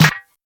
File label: snare one shot d sharp key, Royality free snare sample tuned to the D# note. Loudest frequency: 1743Hz